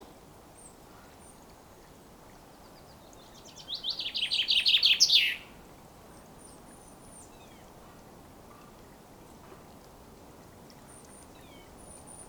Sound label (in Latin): Fringilla coelebs; SETE